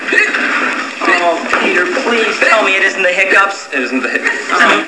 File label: hiccups